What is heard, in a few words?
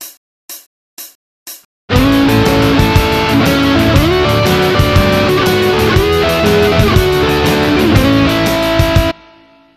blues basis, guitar